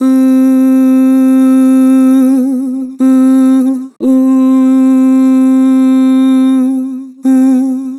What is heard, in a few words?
Vibrato "Ooh", Harmor Vocals